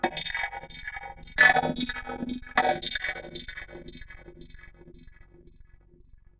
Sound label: Ring Mod, Cymbal, contact mic; Murf & Ring Mod